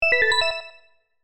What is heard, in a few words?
arcade ui